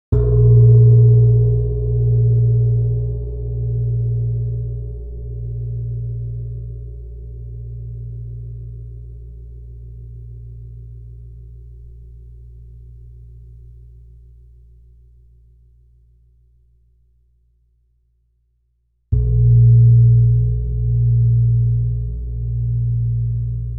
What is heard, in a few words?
Gamelan; Propeller Island - Complete Gamelan